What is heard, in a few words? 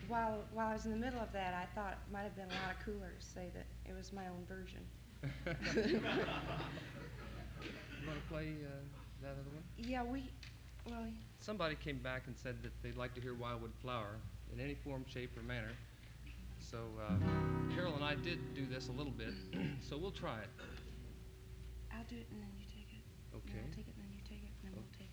Applause